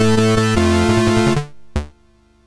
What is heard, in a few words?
Security Alert, MOS6581R3